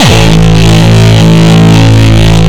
Longkick, kicks

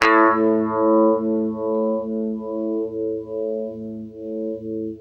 UNIVIB A, Guitar; East West; Steve Stevens Guitar Samples Collection

